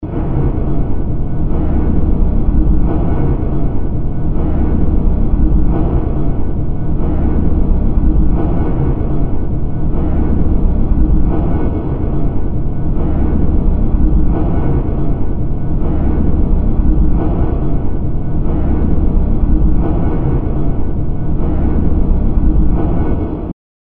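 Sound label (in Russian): металл; Страшные звуки для монтажа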